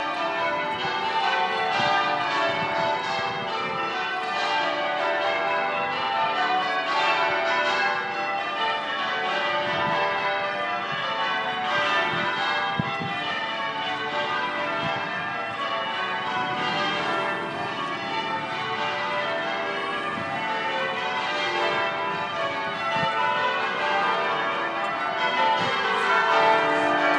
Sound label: church bells; rooked spire